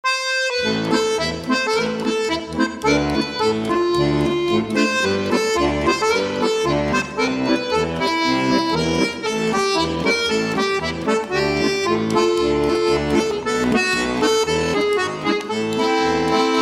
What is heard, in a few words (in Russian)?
Garmon akkordion bayan